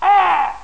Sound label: kill